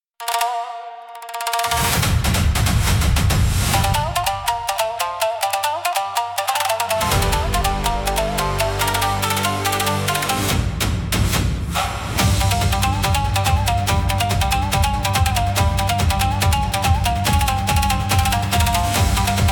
Blade on the Bridge, cinematic japanese style battles